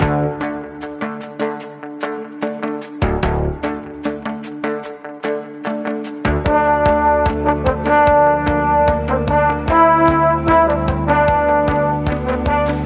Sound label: keys and horns; loops; Phonky